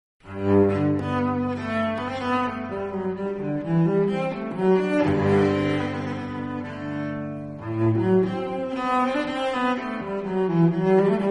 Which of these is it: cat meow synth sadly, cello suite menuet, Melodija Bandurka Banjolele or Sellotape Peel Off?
cello suite menuet